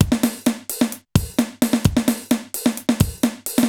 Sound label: Alesis HR-16B (130BPM), beats; musicradar; cyborg beats